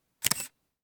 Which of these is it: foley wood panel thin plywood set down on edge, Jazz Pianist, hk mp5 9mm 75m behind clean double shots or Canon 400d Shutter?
Canon 400d Shutter